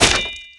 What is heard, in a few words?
small blade ring